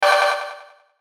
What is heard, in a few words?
BUTTON SPARKLE DELAY; Sparkles; Sound Design; Multimedia; Blastwave